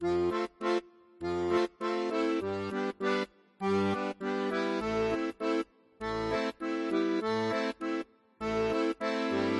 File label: Bass Left Hand